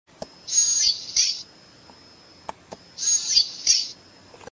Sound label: piezo